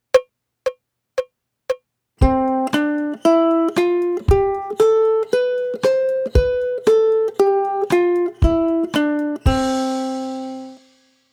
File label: c major scale